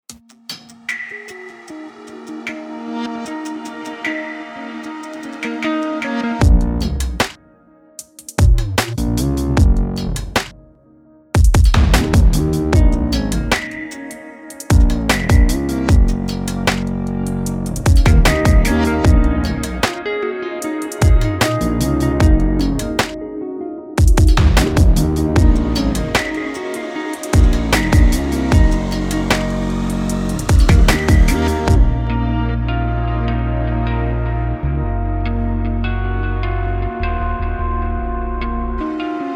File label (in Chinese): 吉他 Electric Vintage; Session Guitarist; Komplete